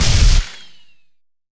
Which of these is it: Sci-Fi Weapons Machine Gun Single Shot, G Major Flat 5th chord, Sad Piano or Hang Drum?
Sci-Fi Weapons Machine Gun Single Shot